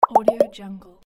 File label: balloon pop snap; federicosoler